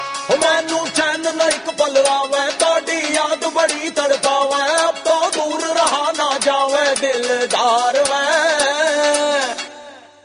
Punjabi

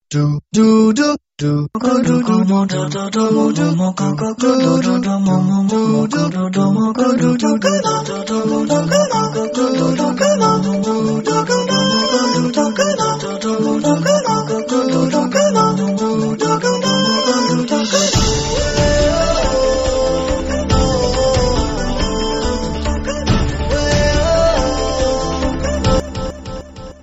Tone; Tata Docomo

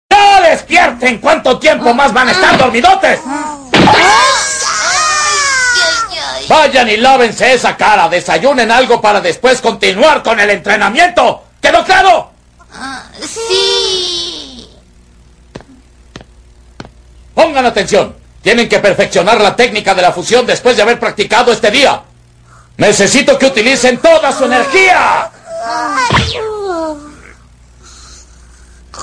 Wake Up With Piccolo

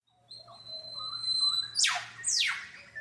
Whip Bird

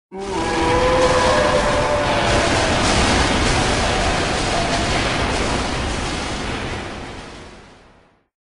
Scream; Magolor

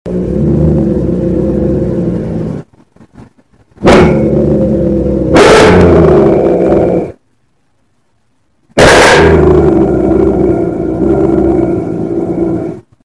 Doberman growling

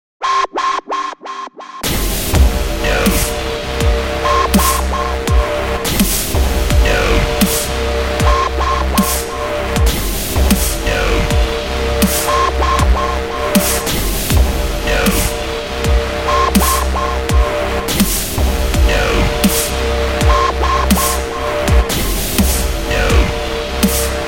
Electronic Meltdown

